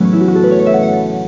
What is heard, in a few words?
harp; Ishido - The Way of Stones; Accolade